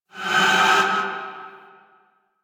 Void Charge; Weapons; Warframe; Duviri; Incarnon Adapters

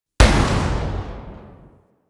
Amp Barrel Tail, Weapons; Warframe; Operators; Amplifiers; Amp Set 2

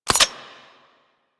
Cock, Heavy Shotgun; Weapons; Corinth Prime; Warframe; Tenno

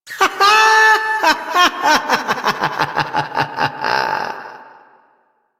Laughter; Warframe; Entrati Doppelganger; Evil Twin

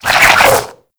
Whip Spin; Warframe; Weapons; Infested

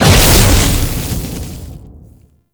Enemy Spawn; Warframe; Man In The Wall; Enemy Gameplay

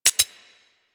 Arch Cannon Low Ammo, Weapons; Warframe; Archwing

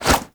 Inf Punch Whoosh, Infested; Warframe; Weapons; Inf Kick Punch

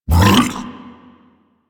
regurgitate cast; DE; Warframe; Warframes; Grendel

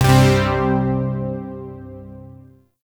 Shawzin Chromatic Chord; Zariman Shawzin

